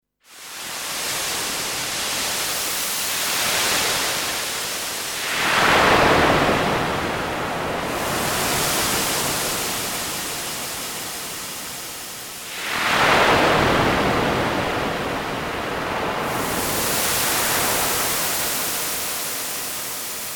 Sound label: Synthesized Sea, Synthetic electronic sound of the waves.; Swells; Sound Design